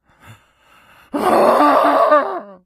moan, infected, idle moaning

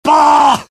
negative vocalization; demoman; source engine; tf

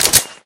dual pistol deploy; Left 4 Dead; Source Engine

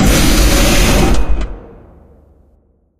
rat maze up travel; Source Engine; Portal 2

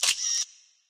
camera; Source Engine; tf

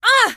impact; episodic; episode 1; Source engine

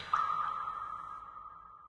ambient water drips; source engine; portal2

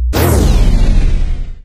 medigun heal detach; tf

